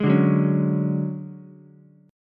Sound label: D Major 7th Flat 5th D down Ukulele; strummed; JGuitar